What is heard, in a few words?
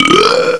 Belch; Apeiron; Amperion